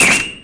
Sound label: two hand quicken; knight